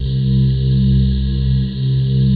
LIGHT OOHS, pads; Pro-Rec - Synthsonic

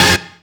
Synths F; Vengeance - Essential Clubsounds Vol.2

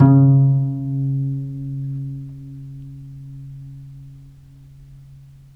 viole pizz C#2, Historical Instruments; USB Soundscan vol.23; AKAI

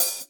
Open Hhat, HiHat; Equipped Music; Loungin House

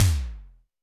Tom Ol Hex, Alesis D4 drum machine

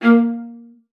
A#3, VIOLA SPICC; Xsample VOL-5

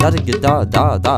INDIA R, Heart of Asia, IND ENS

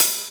hihat one shot

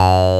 MATRIX 12, OB Synths, Analog

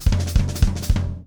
DOODLE, Drum; Steve Gadd Drumscores; GEMS; LICK GEMS

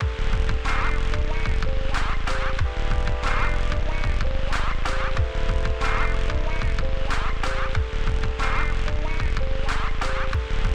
93BPM, hip hop; InVision Interactive; 40 Oz Phat Slammin

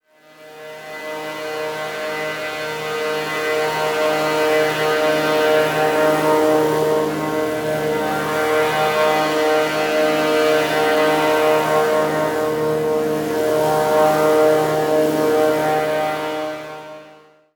MODULATED DRONE, DEEP ATMOSPHERICS; Sonic Foundry (Sony Creative Software); Vir Unis Infusion

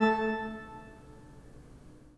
PIPE, 3DOrgans

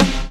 SD, dance drums hip

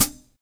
Hi Hats, Roland BK-5

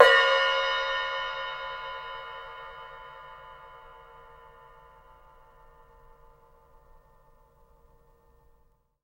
PRC MALLE, Mallet Hats, Asian; Roland L-CD701; Asian 2

